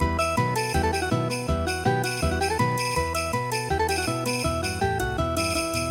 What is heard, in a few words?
MANSIKKA flamenco